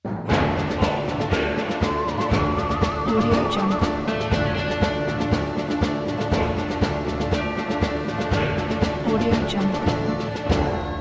western movie ident

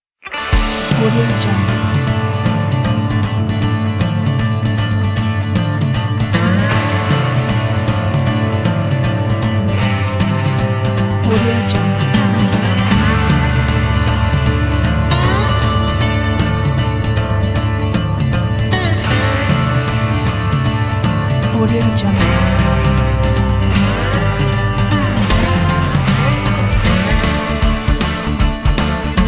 western rock

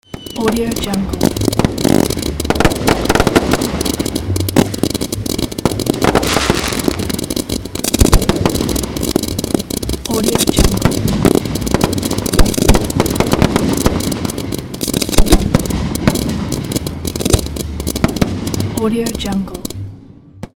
fireworks

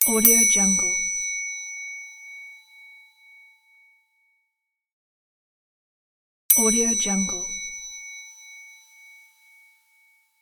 clink

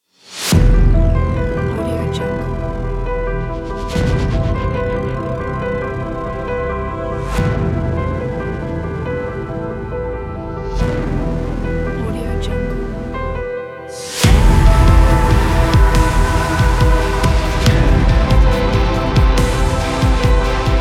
cinematic emotional mystery ident

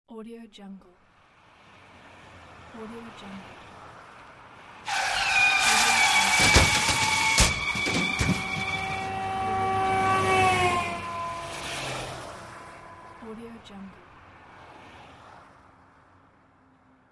cars accidents; woodpeckerstudio

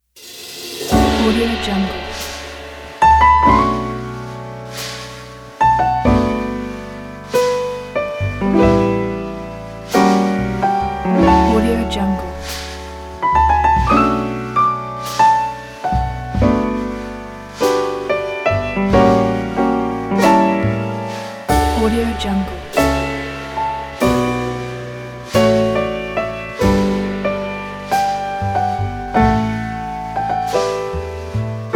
blue mood; pressmaster

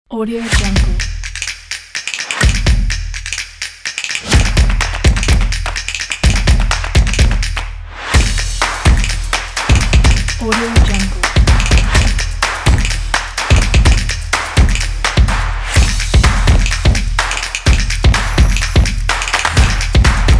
clap

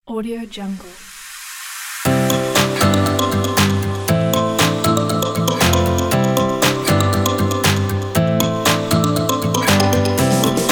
energetic inspiring upbeat pop

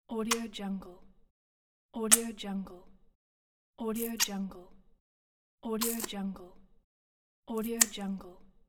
finger licking

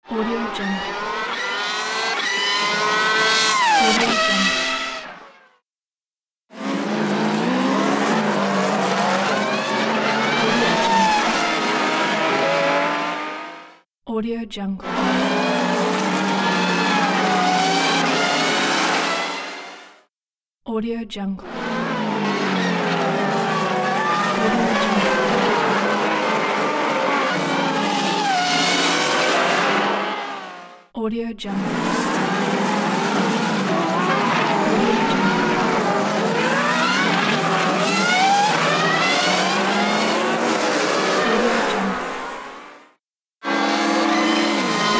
formula 1 racing atmosphere

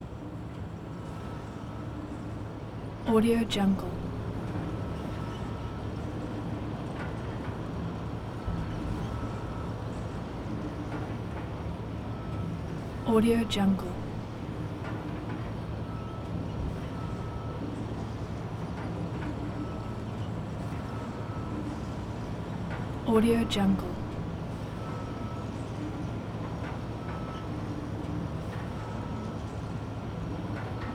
dock ambience constant activity oil rigs nearby

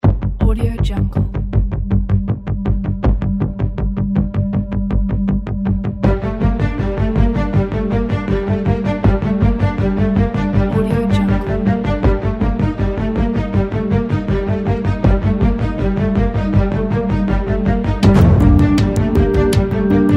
emergency

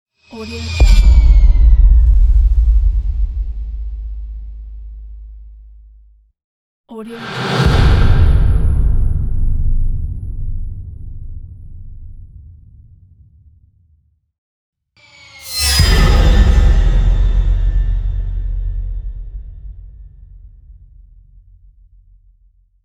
deep cinematic impact; soundwood

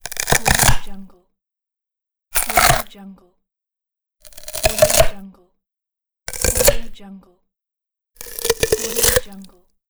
short bone bites

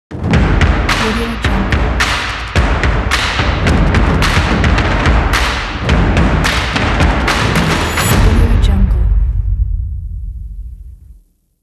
drums percussions logo

